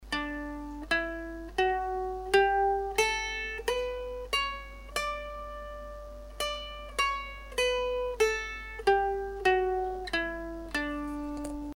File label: mand d up and down